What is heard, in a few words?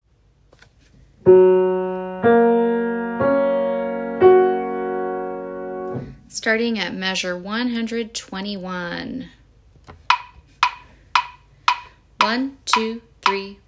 hope for resolution piano; HS Falcon Choral Festival; BGSU